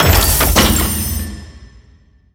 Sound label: goldbosschest open